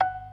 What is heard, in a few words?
PERC B 3; B3 FULL